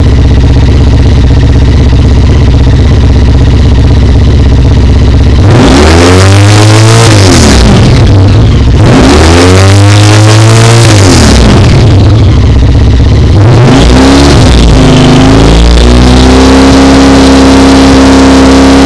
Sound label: ST1300 Exhaust NO Restrictor; Staintunes